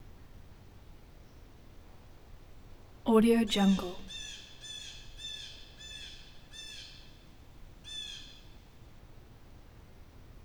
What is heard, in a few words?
blue jay medium distance